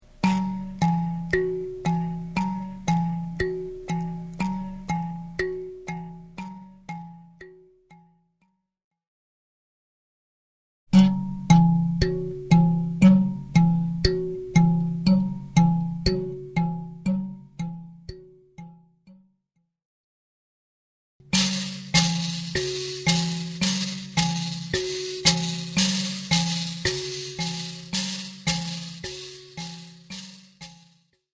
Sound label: Mbira Timbre